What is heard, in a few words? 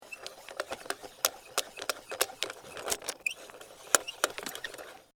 Foley, Handle, Metal, Device; Miscellaneous Electronic